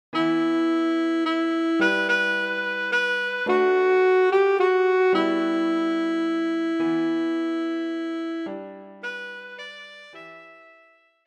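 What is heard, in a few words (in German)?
Scarborough Fair, Altsaxophon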